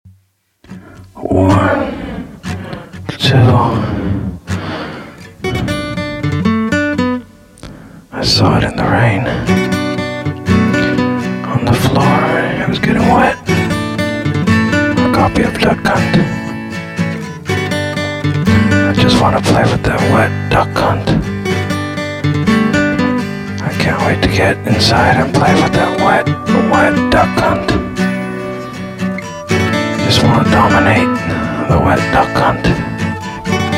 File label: Duck Hunt Light Gun in the Rain